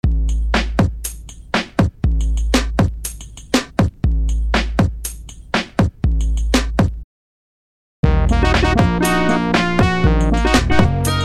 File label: RINGTONE